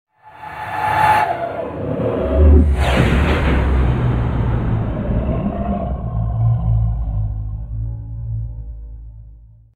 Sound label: Eerie Double Tonal Whoosh with Growl Spark; Hollywood Edge Sound Designers Tool Kit